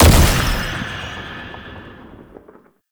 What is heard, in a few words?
Unyielding Gallop Hit; Warframe; Dagath